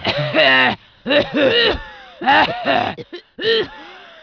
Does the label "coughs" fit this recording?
Yes